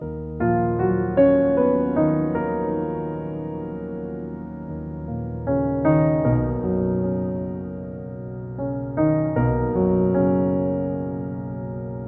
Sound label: music quiet-touching loop; Necrobarista Final Pour